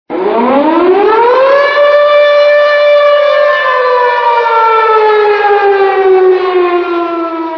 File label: Air Raid